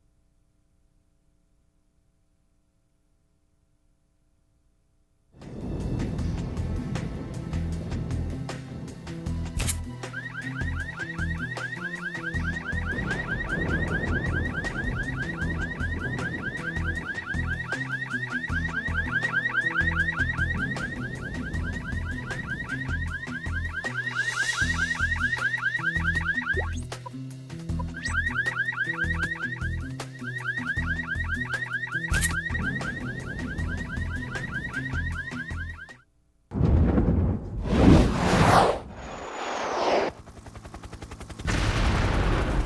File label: CAR ALARM